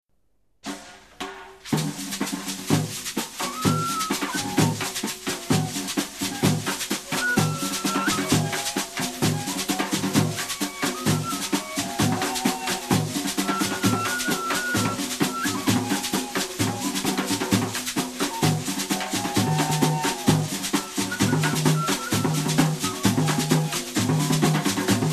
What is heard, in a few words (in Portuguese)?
Toques com todos os instrumentos